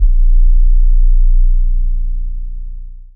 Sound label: kicks heartbeats